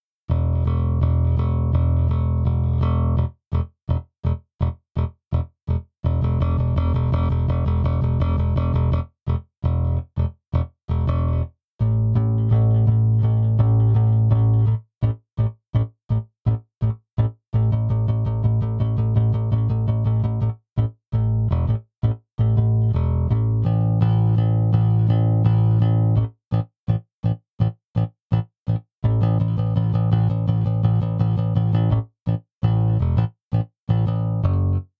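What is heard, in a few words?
Bass Default setting pick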